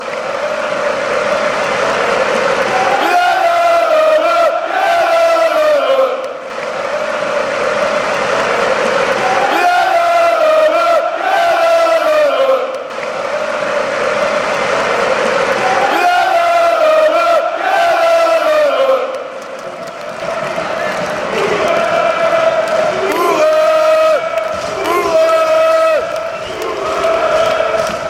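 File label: de balade van oost, football chants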